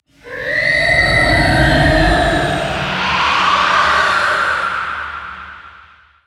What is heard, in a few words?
beast ghost rise